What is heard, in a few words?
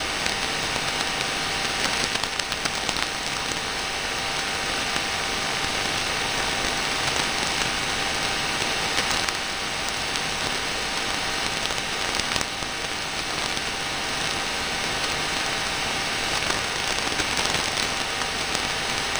Noise